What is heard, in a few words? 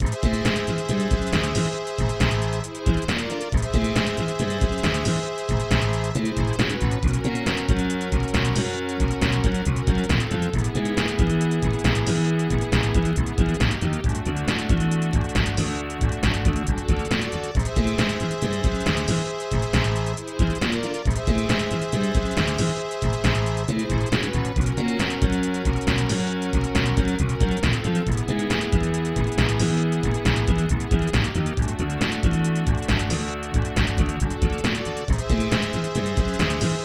Music